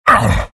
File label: pain; beastmaster